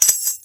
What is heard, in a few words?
Tamboreen; StecMetronome